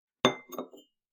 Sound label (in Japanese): 受け皿にティーカップを置く音